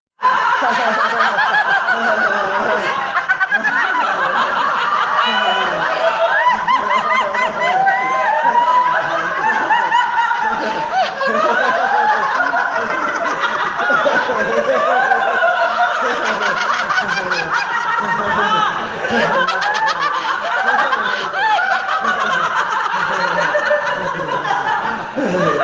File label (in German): Lachyoga